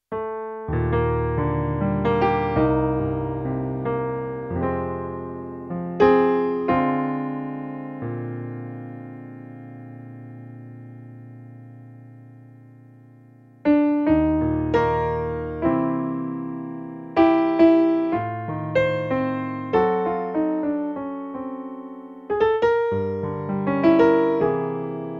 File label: solo piano